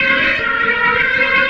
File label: SPHERE D+B 160, Drum+Bass; eJay